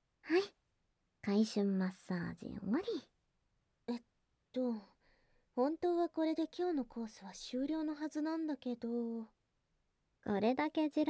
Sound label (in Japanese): ぐっぽり耳奥ゾクゾク耳舐め; 禁断のヤリすぎ鼠径部責め